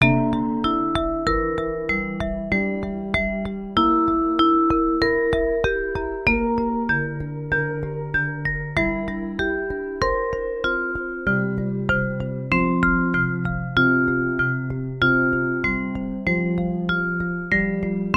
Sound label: Sincere Marimba